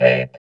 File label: VOX VOX, Electro Vox